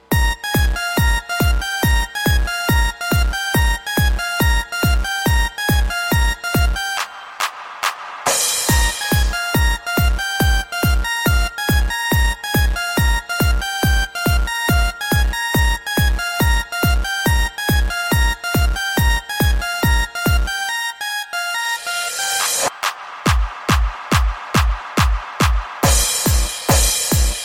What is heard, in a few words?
Club Trance Alarm